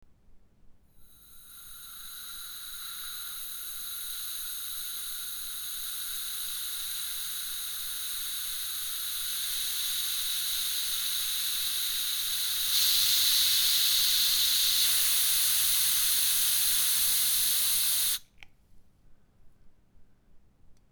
air duster

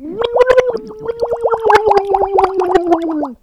drown

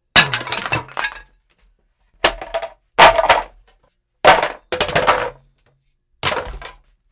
dropping breaking

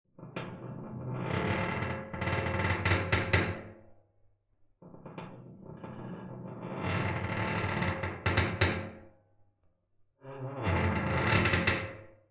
metal cabinet door

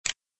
sys click; afrikalan

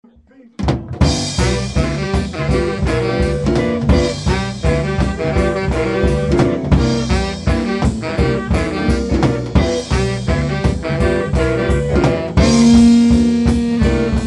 Blues quartet, fin

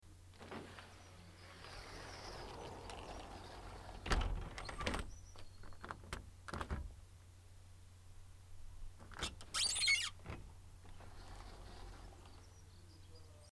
sliding door of veranda closing

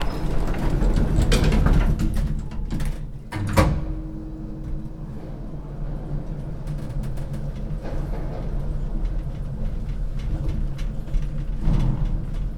elevator ride

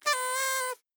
Party Horn; Mexico

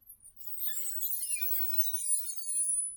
shreeek

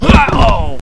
death

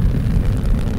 flamethrower End Fire; RainbowThrower

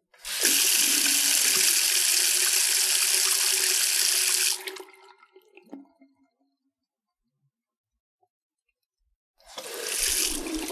faucet water into sink

